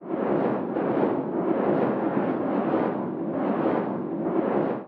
damage hits explosions, Warships

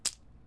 Crab Move; Civ4; Resouces and Improvements

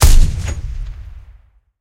m4 single shot, guns; War Thunder

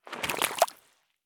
turn puddle; Escape from Tarkov

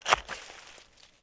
Dig Dirt; Civ4 warlords

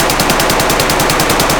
akm distant indoor loop; Escape from Tarkov

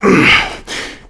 player climb efforts

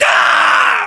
melee charge, voiceovers; english

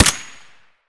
m1a fire silenced close; Escape from Tarkov

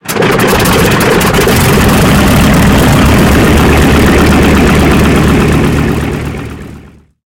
engine b25 start; War Thunder

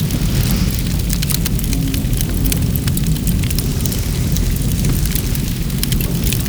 fire wood trash small

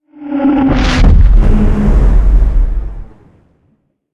shell passby; War Thunder

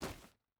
footstep hide stones run; Rust

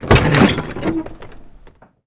door truck military open; Doors; Call of Duty Modern Warfare

